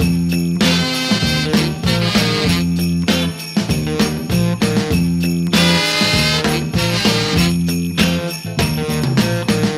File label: horns, Phonky; loops